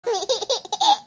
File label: Giggles